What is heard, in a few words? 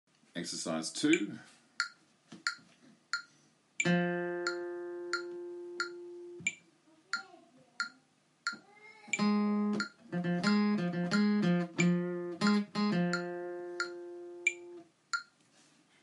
Melody